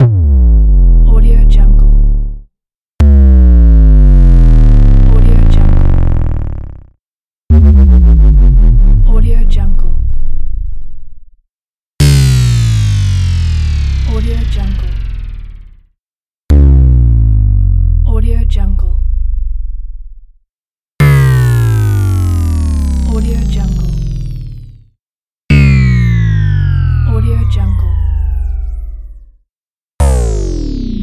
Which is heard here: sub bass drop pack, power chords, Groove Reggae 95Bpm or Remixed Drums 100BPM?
sub bass drop pack